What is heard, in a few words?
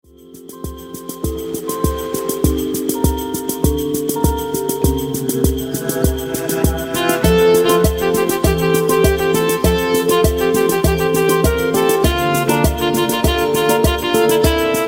Groove